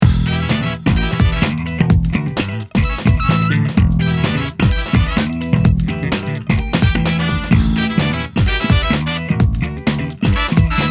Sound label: BRASS G SOLO 36 bar BPM128